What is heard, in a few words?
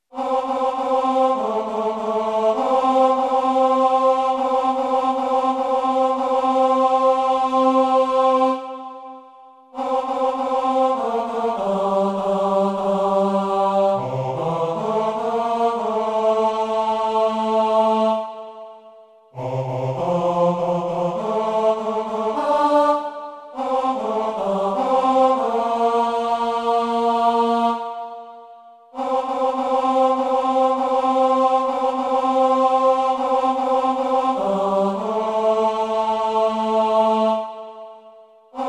santa maria tenor